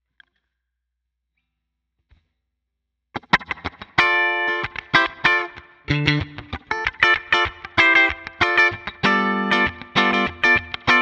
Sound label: Relay cable sim off; mejorado